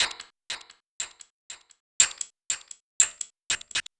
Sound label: Whisper Beat, Electronic Beats; Sonic Foundry (Sony Creative Software); Myths of Technology